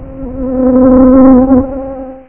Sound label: fly